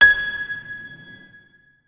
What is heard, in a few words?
notes, keyboard piano; Hideout Furniture